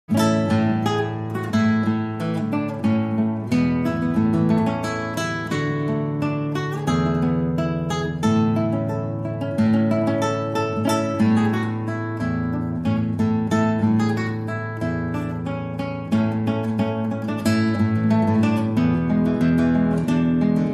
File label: Solo Guitar